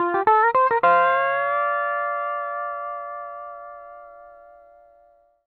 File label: 105 L, PD LOOPS